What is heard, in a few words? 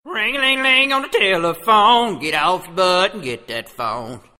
Ring